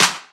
EDM Future Clap

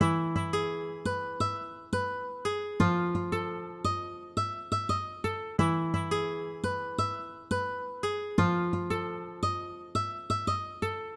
Guitar, Hip Hop Exotica, 086 Emin; Apple Loops; Big Fish Audio

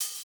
Open Hat Hit, 096 Amin

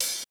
Open Hat Hit, 096 Amin; Hip Hop Exotica; Apple Loops; Big Fish Audio

